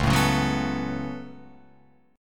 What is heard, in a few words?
Db 7th Suspended 4th Sharp 5th Db down Guitar Open F; JGuitar